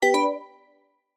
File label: click excited